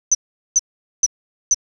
kanetataki; insects

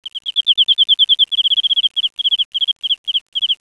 enmakoorogi; insects

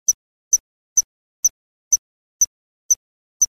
kanetataki, insects

